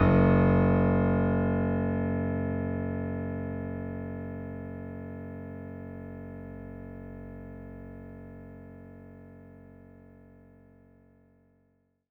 F#1, synth; 90 s Sample CDs; NiceBeats; SynthLine VOL-23

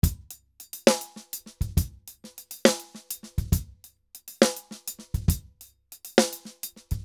Drums 136, Festa Latina, Drums & Percussion; Best Service; Festa Latina VOL-1

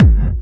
hardbassdrumz, breakcore; Bazzdrumz

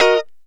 Lounge Guitar Chick 9ths G#3; Lounge Guitar Chicks

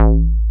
JUNO SUB; Techno Trance; Synth Basses; E-MU Formula 4000 Series Vol. 2

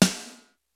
YAMAHA RY30; Rubber Chicken Software Sample Bank

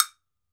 TOKE BEL, African Percussion; E-MU Producer Series Vol. 7 – Old World Instruments

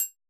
perc, Roland MC-909

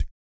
DEEP BREATH

